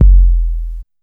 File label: KICK XL, Big Beat; Big Beat Samples & More; AKAI